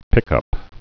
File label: pickup